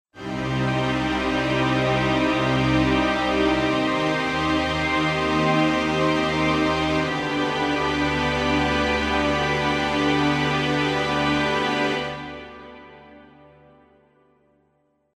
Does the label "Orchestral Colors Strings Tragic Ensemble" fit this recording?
Yes